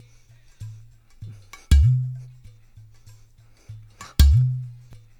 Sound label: loop, Percussion